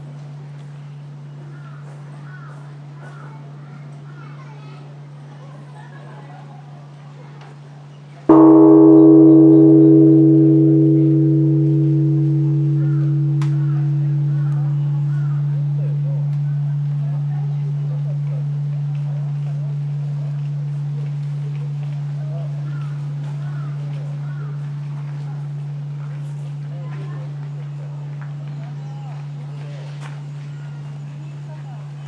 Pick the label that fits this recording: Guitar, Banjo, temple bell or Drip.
temple bell